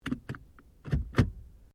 Car Ford Focus Gear Change When Off

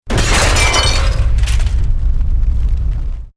EXPLOSION MEDIUM METAL GLASS

